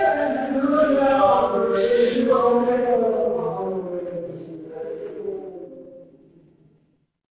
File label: crowd murmur